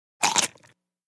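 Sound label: Single Short Bite Pickle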